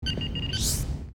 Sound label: hologram on